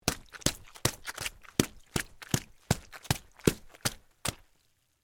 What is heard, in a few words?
Mud Run Short, Footsteps, Mud, Run, Short, Water, Splash; BlastWave